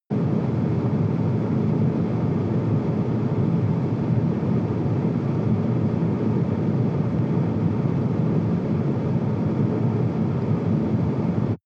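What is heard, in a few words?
sports car interior ventilation step 9-15; verkehr transport; autos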